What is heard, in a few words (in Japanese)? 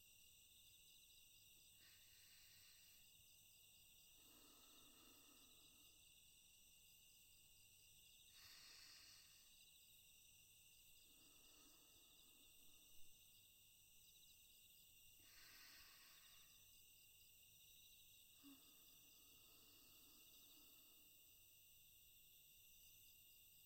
寝息ループ用（虫の声入り）